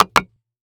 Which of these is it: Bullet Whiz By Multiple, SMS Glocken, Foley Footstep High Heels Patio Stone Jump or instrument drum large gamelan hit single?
Foley Footstep High Heels Patio Stone Jump